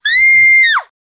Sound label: Female Scream Shortened